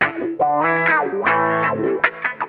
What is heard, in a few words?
GUITAR